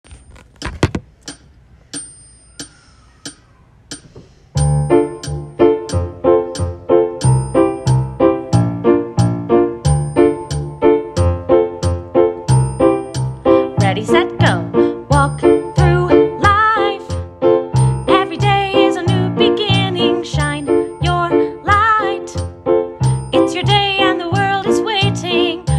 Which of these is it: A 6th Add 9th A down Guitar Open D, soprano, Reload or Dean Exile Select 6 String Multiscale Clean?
soprano